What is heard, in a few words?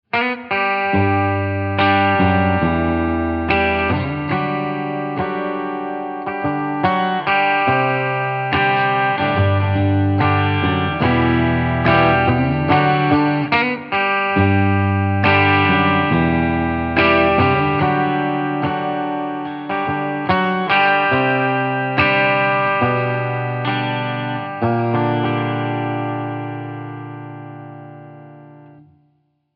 chime